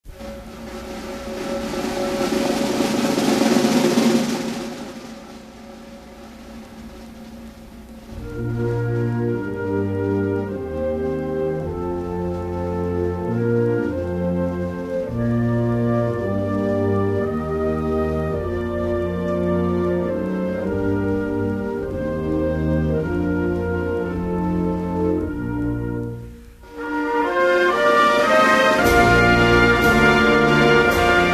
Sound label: anthem